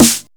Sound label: snares; Vengeance Samples